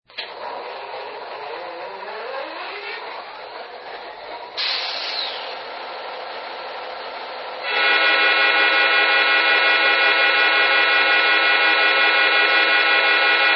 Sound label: F59PHI, trains